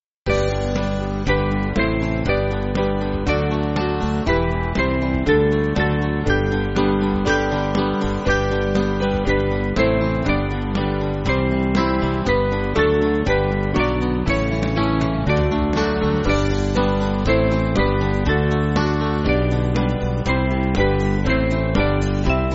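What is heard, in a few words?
Music Backing Files for Churches; O Sinners Think; French Tune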